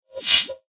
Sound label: Slide Movement Multimedia; Web Interactive; Slides; Objects; Blastwave